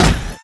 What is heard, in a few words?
player step